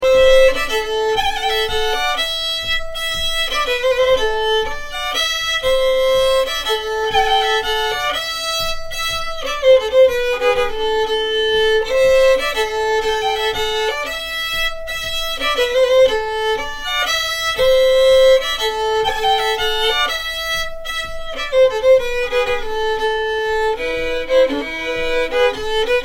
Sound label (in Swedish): polska; Urgammal; efter Gammel-Spaken